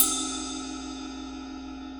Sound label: 22 inch sound caster custom mega bell ride, cymbal; Meinl